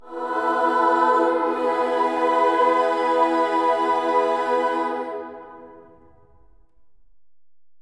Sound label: amen; Spectrasonics; Symphony of Voices; BC AMENS